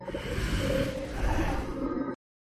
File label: White Bear Stun